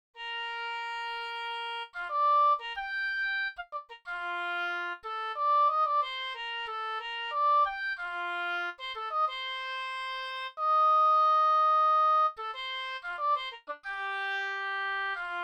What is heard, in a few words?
Audition Etude; Fall